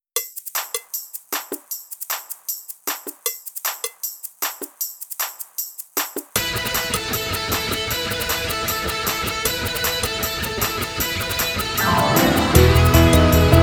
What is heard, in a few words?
drumless